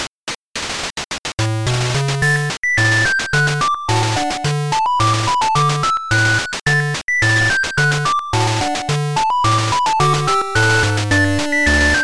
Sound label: 8bit Lets Take A Walk